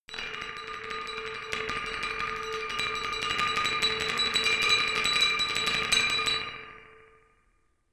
glockenspiel dark tremolo low

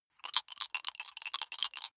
big three rattle in hand closed

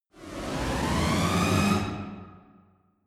fake orchestra short

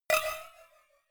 cowbell clank muted hard

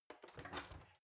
oven open soft

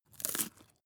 bite biscuit

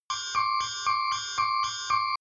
sci fi alarm beep room

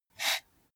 computer mouse draw short

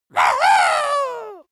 dwarf cheer "wuhu"

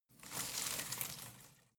home plant leaves rustle regular

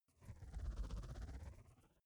fly by alien hover

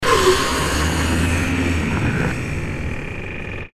hydraulic door

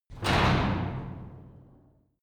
garage door close short hard

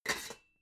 pots clank quiet

